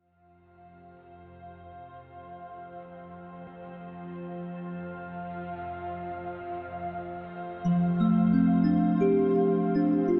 Jazz Music, Ambient Soundscape

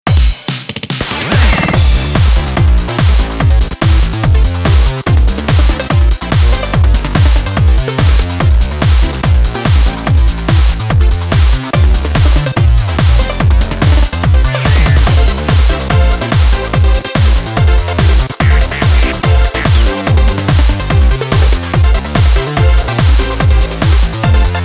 Instrumental

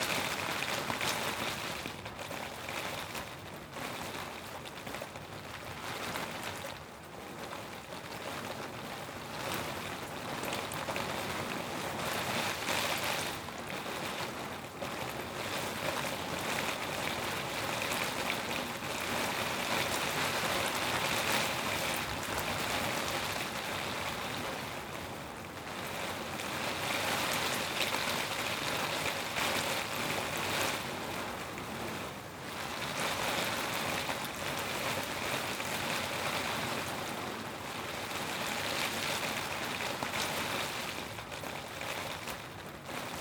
Brown Noise Heavy Rain Sounds Window

